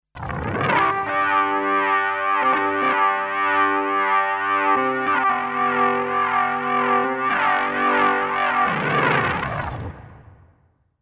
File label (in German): Tube Tech PE1C Orgel Overdrive LF Boost 0 Atten 6 60 Hz HF Boost 8 3 kHz Atten 10; AttenSel 20kHz